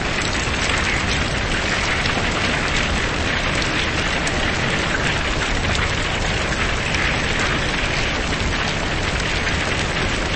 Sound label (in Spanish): fuego; Pokemon Iberia V1.03; Pokemon Essentials